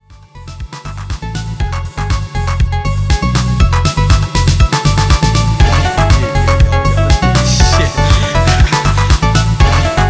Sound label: salsa